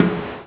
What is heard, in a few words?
BNGOLPF